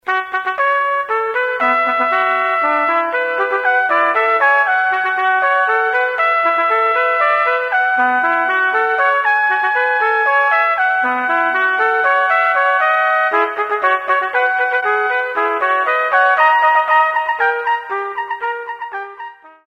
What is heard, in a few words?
fanf 2 tpts